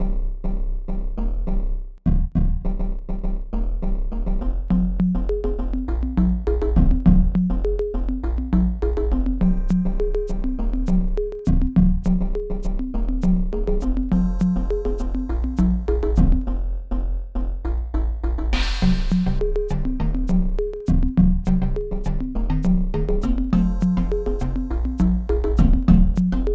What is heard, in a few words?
jungle theme